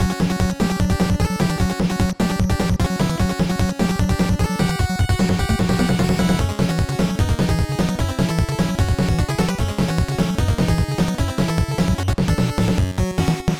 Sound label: Alert; Metal Gear